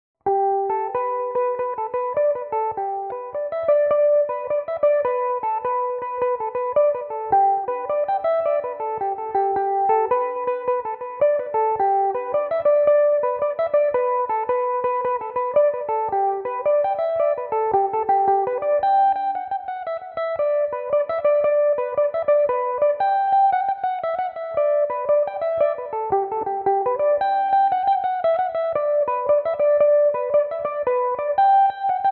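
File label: G major, Irish mandolin; The Old Favourite; Belmuse EM-190 electric mandolin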